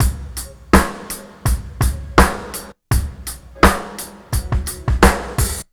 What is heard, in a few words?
Drum loops Boombap